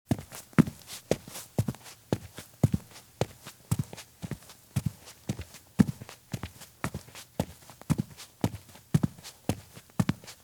Women's Heavy High Heel Shoes Walk at Very Fast Pace on Grass; Sound Ideas; Footstep Sound Effects